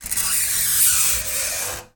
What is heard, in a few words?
nails on metal single, Fingernail Scratches; 3maze; Scratch - Multi-Surface Fingernail Scratches